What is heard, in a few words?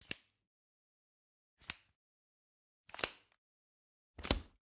Generic Button Metal Tiny